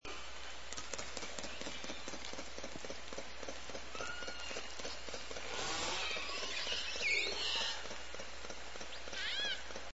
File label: clicks slow faint calls, SRKW